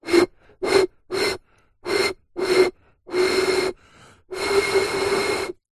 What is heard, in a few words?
foley blowing air over top of plastic bottle breathy various; zapsplat